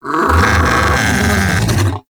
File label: Vocal Alerted; Hunting Critters; Undazoa